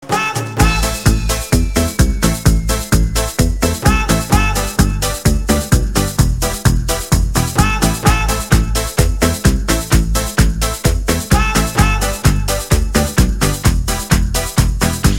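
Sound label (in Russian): без слов инструментальная часть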